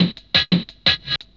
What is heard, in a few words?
LOOP, Drum & Bass, 174 BPM; Ueberschall; Drum & Bass Flash Trackz